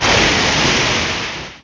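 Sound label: plane engine; Player SE; Nintendo; July 2020 Gigaleak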